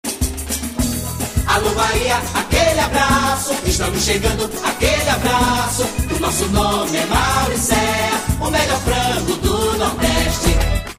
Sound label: Jingles